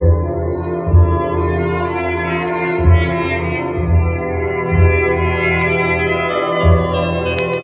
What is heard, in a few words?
strings, Sad